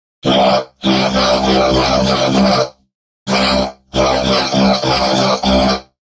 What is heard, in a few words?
hahahahahaha